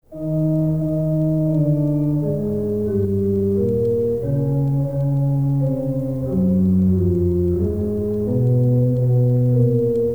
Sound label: organ; Meine Seele Erhebt Den Herren; organ masterpieces cd